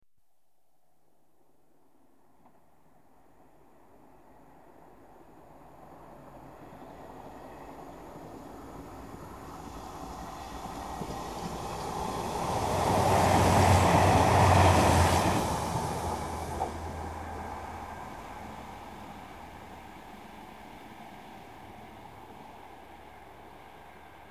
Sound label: Trains Passing Diesel commuter; The BBC Sound Effects Library